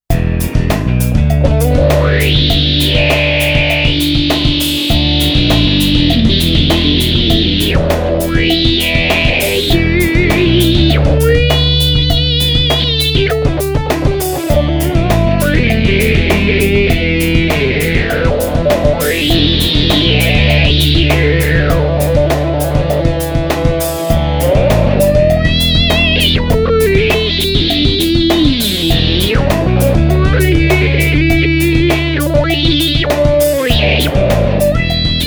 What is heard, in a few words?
talk pedal